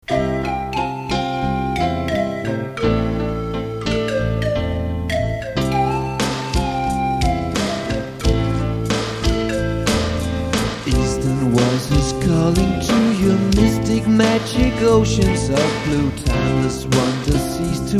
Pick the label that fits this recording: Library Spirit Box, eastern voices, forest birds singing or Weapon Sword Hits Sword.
eastern voices